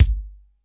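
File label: dry kick, dry kits; Crypton; DrumBase5000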